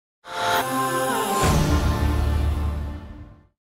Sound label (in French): signature sonore audio logo; renault